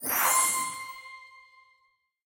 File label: Spawn Special Candy; candy crush saga gamerip